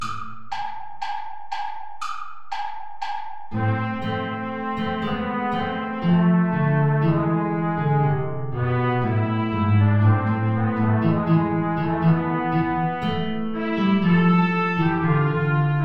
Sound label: play along without guitar; hilton john; you lovers that have loves astray